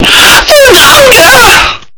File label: earrape version